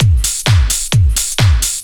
straight, Techno Trance Essentials drum loops

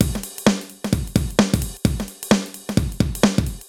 Alesis HR-16B (130BPM), beats

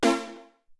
Tek Hit

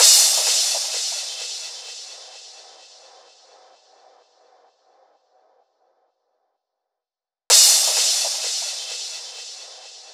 Crash, Drum Loop 128BPM; VEC5 Drumloops; VEC5 Multi Loops 128BPM; Loop Elements

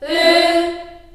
F3 CHS EH, CHILD EHS, Choir; USB Soundscan vol.28; Choir Acoustic & Synth; AKAI

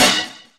Horn Hit; Proteus 2000; Digital Sound Factory Proteus 2000

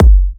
F# kicks; markus hakala kicks

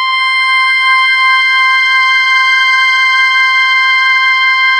SAXY C5

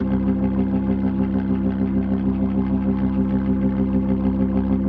C1F, B3, Vintage Keyboards

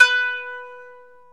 ETH KANUN, Eth Plucks, Saz & Kanun; Club-50 - Foundations Roland

